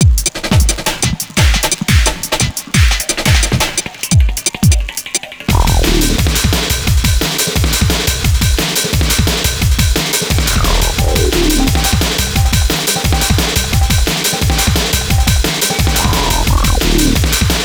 drum

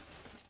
Step

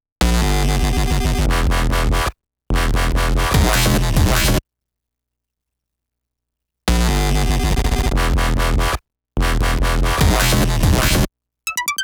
Bgm

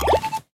Heal Injury

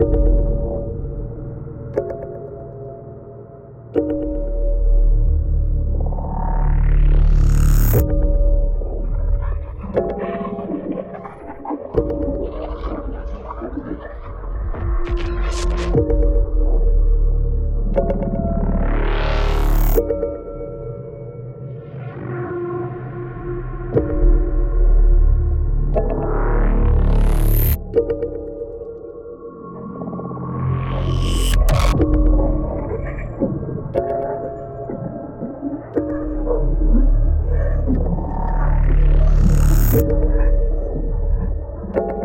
Tutorial Music Looped; Voidbreaker; ps5 windows xbox series xs

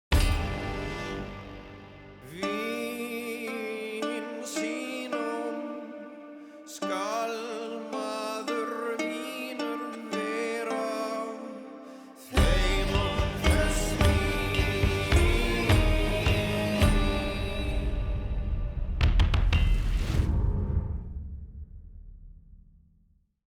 song; assassin s creed valhalla

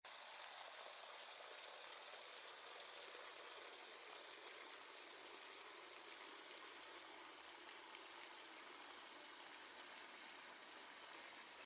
Sink Running

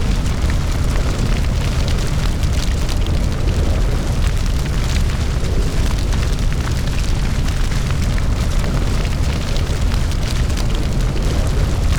Fire Loop

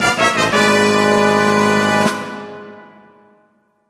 Jingle Unlucky; Ape Quest; PSP

